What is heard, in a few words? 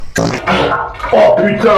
fart